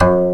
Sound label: GAYAGUM G 2, KOREAN; AKAI S6000 CD-ROM - Volume 1